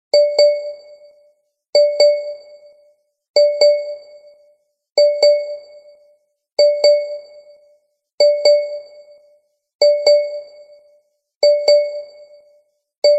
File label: sms original